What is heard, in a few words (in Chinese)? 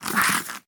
狐狸被激怒声